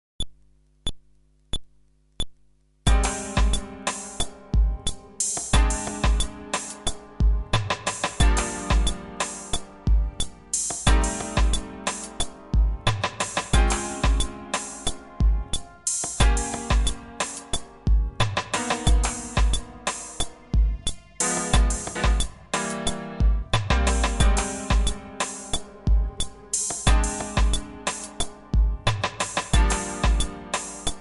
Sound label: Groove ベース無し